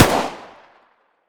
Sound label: bizon distance fire; weapons